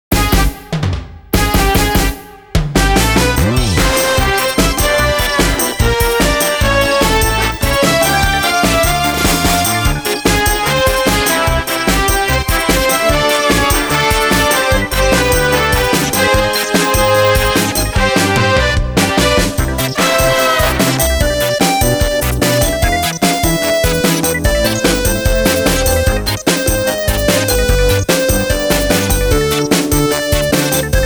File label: Karaoke; PULSENOTES